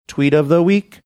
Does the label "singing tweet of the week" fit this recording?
Yes